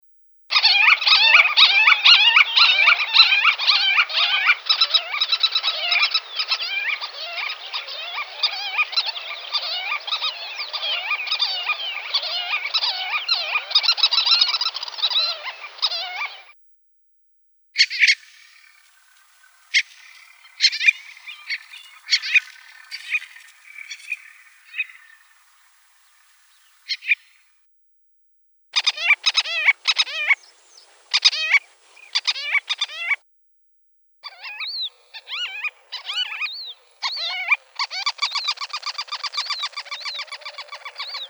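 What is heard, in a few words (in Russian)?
Pigalica belohvostaja, White-tailed Lapwing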